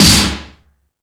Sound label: Percussion FX, E-MU Pro-Cussion; E-MU Pro-Cussion-1